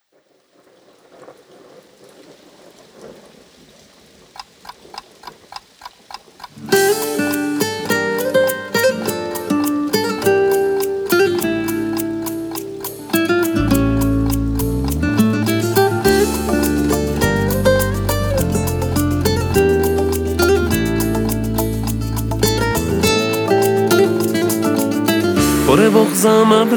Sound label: Freshet of Rain